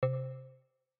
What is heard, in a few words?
click buttons ui menu sounds effects button